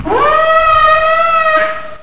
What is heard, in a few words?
momma idle